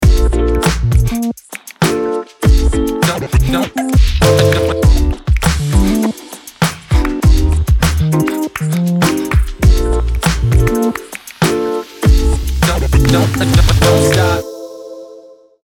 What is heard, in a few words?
Random Beat